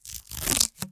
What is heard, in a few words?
Duct Tape Tear; Miscellaneous; 1001 Sound Effects